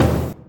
kik; virtual drum sounds

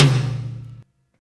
tom; drums; virtual drum sounds

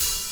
open, drums; virtual drum machine

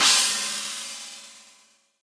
china, virtual drum sounds

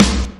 snare, drums; virtual drum sounds; custom drums

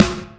snare, drums; virtual drum sounds; custom drums

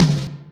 tom, drums; virtual drum sounds; custom drums

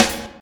snare, drums; virtual drum sounds; custom drums

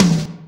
tom, drums; virtual drum sounds

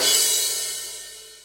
crash, virtual drum sounds

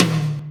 tom; drums; virtual drum sounds

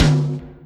tom, drums; virtual drum sounds; custom drums

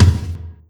tom, drums; virtual drum sounds; custom drums

